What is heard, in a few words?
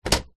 electronic typewriter plastic paper feed flap movement; office; brother ax 450